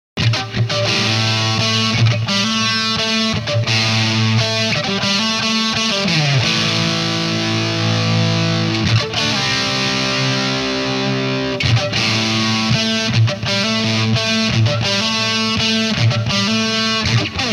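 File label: Power Boost Sunface white Strat, guitar; Reeves Eclipse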